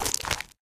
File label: book close; video games; player; taunt; luxury lounge; source engine; tf